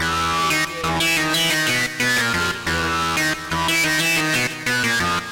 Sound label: Hip Hop Synth Licks 90 BPM E Straight